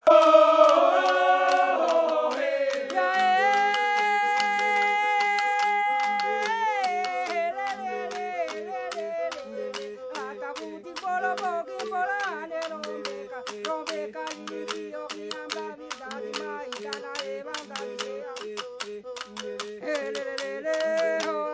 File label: Vocal Hocket Flores